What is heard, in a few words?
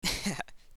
laughter; Assemblee SFX